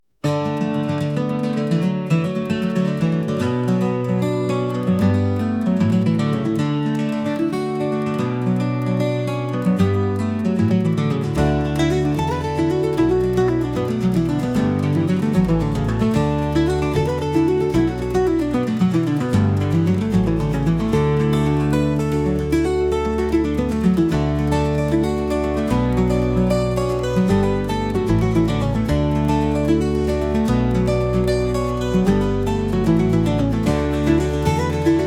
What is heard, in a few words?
calm